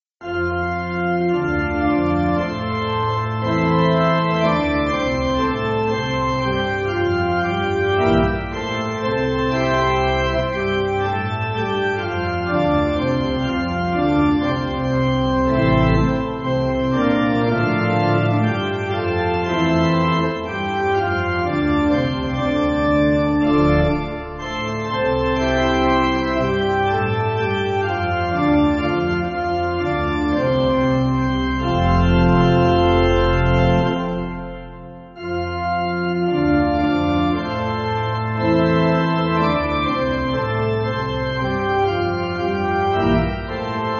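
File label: Pipe, Pipe Organ, Chinese Melody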